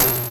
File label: Bent Tom